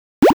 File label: combo; russianblock